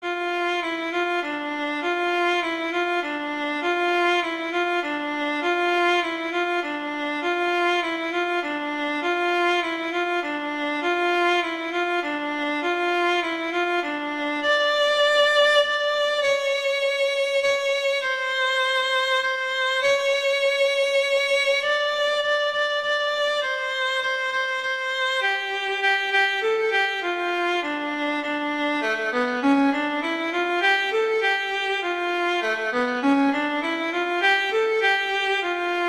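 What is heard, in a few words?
Carol of the Bells, Viola; Christmas Beginners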